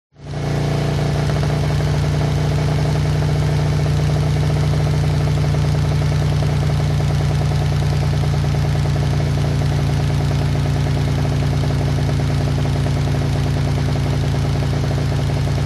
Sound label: MOTORCYCLE Harley Accelerate Splutter; TRANSPORTATION